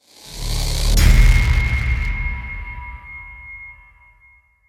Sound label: metal design explosion